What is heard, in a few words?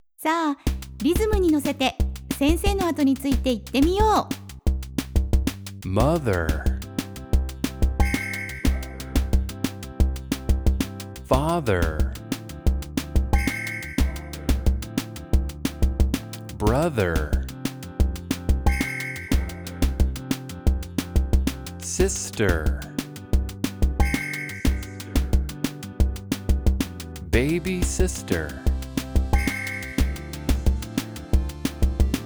bossa nova; kindergarten practice